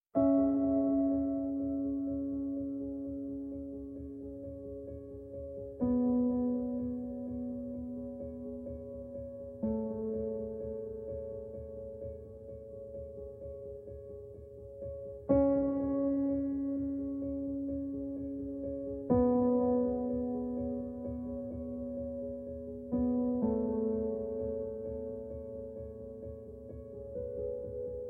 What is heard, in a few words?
Minimal Abstract Piano; Too Loud